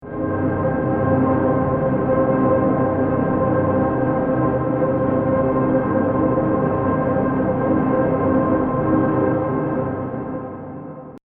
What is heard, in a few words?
space